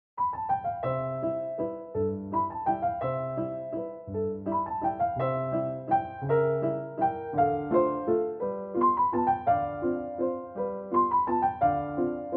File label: The 5 Finger Waltz, piano